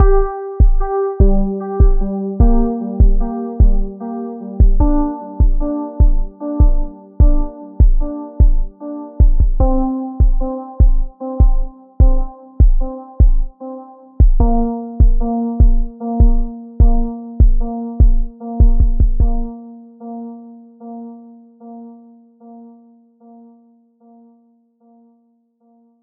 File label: Drumbeats Melodic Simple Melodic Sequence